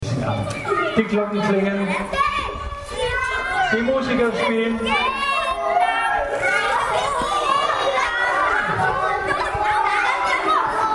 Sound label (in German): Marktplatz akustisch